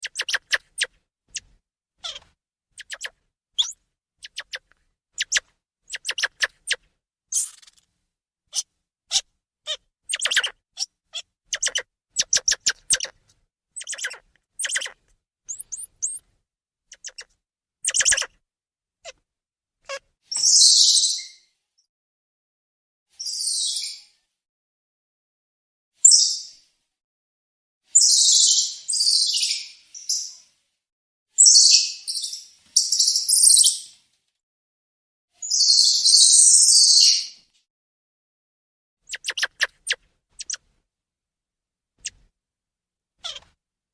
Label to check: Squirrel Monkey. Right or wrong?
Right